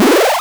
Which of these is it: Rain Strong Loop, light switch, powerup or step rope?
powerup